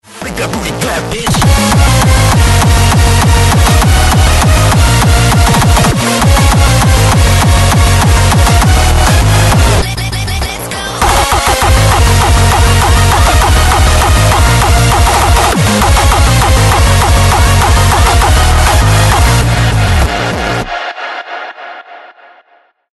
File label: hardcore; lesgooo